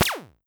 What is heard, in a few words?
standard shot long